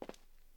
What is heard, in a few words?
footstep; gmtk24